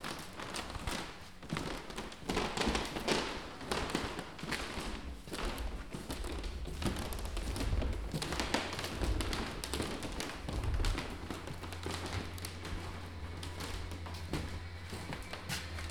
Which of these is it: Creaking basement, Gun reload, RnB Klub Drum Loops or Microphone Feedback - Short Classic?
Creaking basement